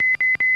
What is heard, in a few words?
Spike